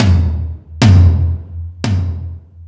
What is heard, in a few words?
Stadium Rox, Drum Machines, E-MU Pro-Cussion